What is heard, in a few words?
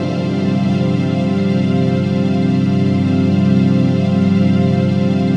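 trance pad; Best Service; XX Large - Pads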